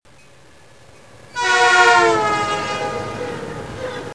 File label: train sounds; Santa Barbara Wine Train Adventure